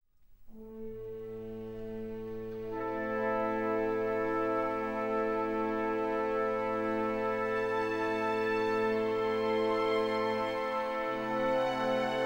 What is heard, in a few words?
Twisted Strings